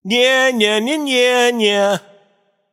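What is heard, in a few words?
tongue, non-verbal; smite; voicepack; merlin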